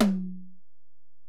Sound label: Tom, Alesis DM-PRO, Drum Machines, Toms